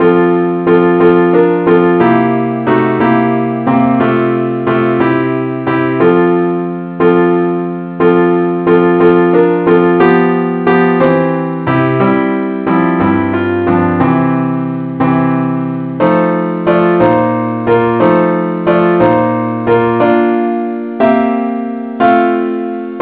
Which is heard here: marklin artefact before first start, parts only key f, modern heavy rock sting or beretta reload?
parts only key f